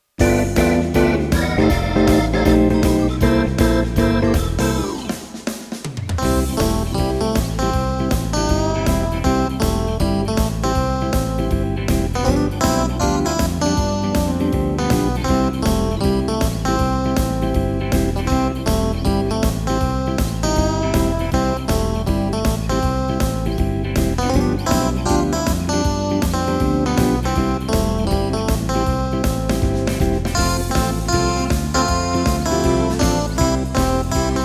Yamaha Rock n Roll

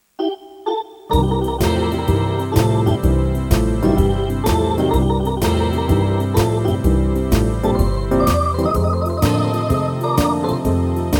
Blues

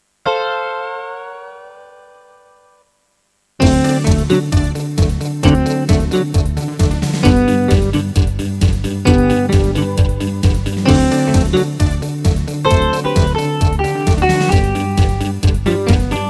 Guitar Soul

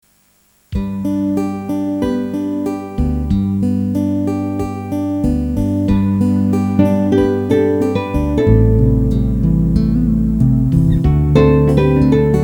Guitar Serenade

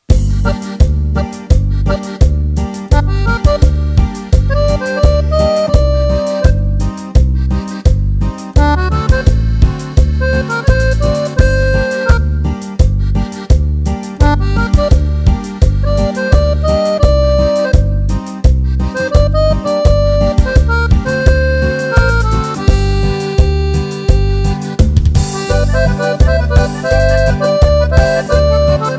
Cumbia Gm

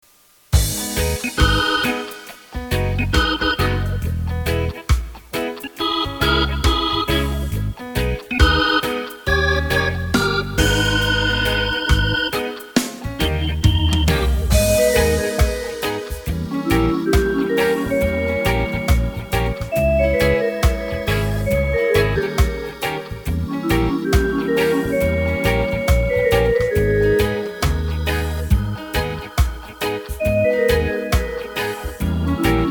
Evening Reggae

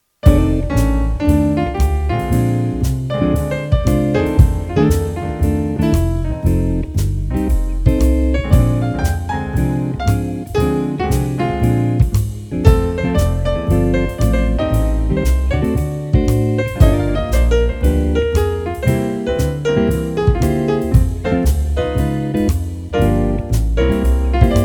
F Blues

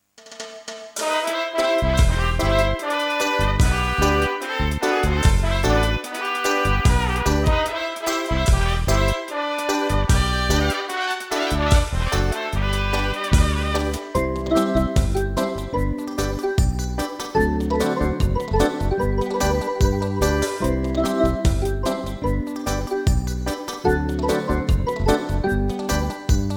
Morning Reggae

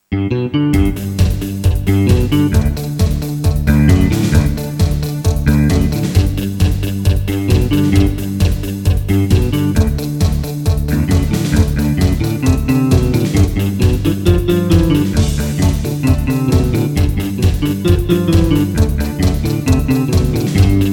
Happy Guitar

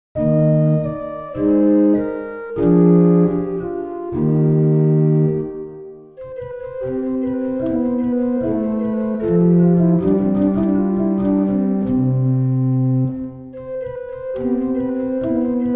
Organ

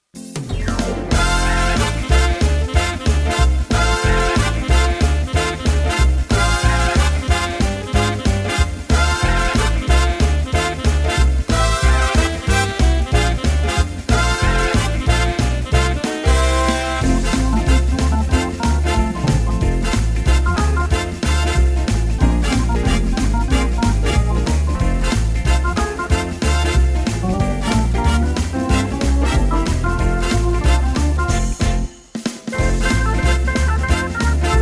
Rocking Christmas

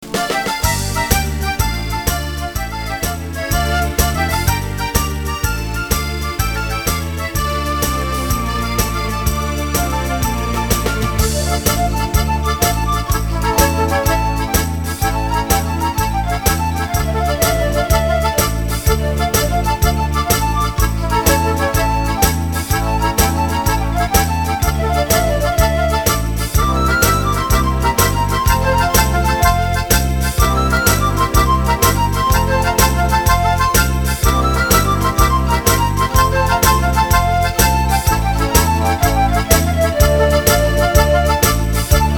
Tarantella

